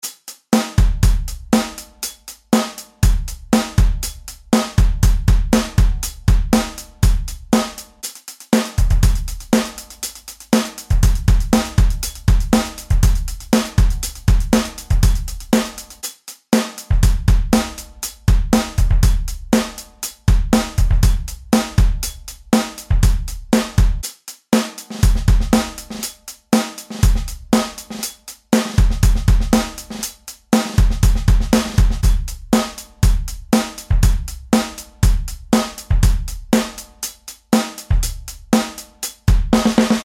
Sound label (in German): Rock Style 4 verschiedene Variationen